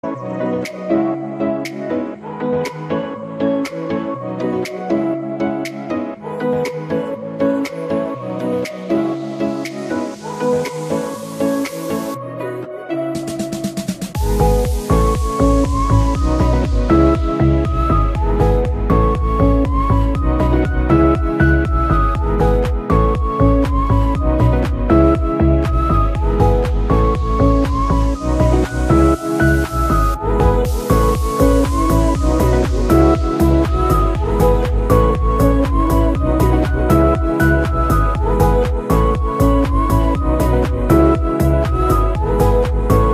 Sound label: background music